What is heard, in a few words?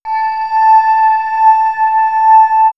Swirls Time Bell, pads; X-Static Goldmine